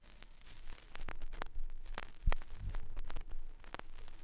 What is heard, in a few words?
Record Noise, Vinyl Record